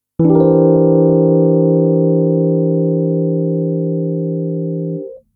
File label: halfdim13